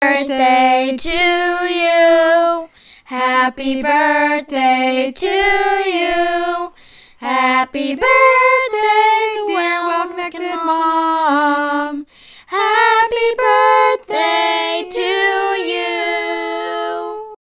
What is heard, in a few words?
Happy Birthday kids and I